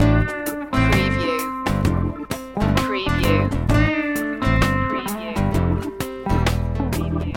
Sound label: sensual noon loop